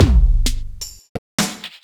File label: Beat, Drums & Percussion; Electrocution; Beats